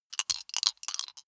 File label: big three rattle in hand closed short